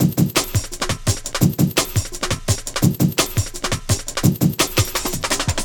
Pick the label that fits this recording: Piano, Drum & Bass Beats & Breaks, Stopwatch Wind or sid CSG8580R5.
Drum & Bass Beats & Breaks